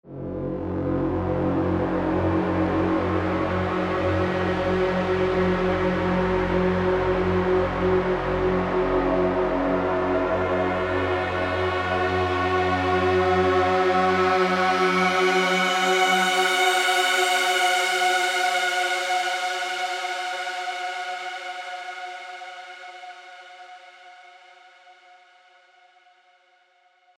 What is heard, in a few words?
Heaven & Synth, techno pads; revealed diva techno pads vol 2